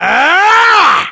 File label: melee attack, english